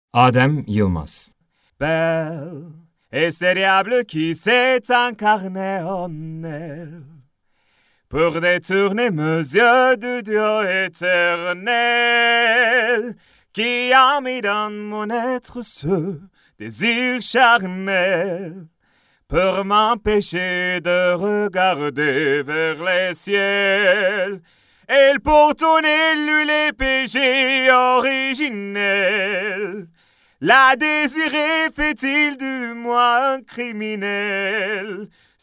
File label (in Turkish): vokal